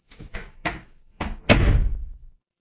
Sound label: door close